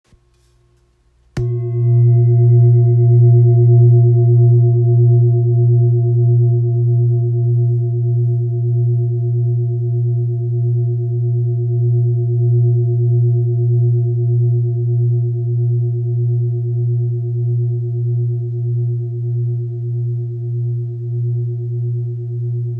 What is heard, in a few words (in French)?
Bol grave fleur de vie la diese